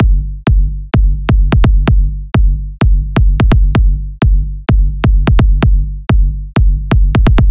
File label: Big Room Kick Loop G